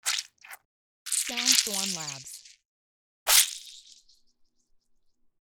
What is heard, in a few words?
squash sounds